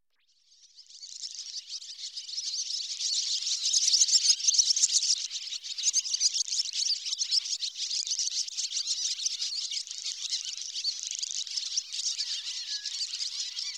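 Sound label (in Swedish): Ladusvala, Hirundo rustica - På telefontrådar; i ladugård; Swedish Bird Songs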